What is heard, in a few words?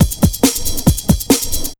Big Beat Drumloops, 138 LP; USB Soundscan vol.25; AKAI; MOVE 138